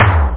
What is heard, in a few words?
E Tom; CU Amiga Super CD-ROM 14; Amiga 8-bit Sampled Voice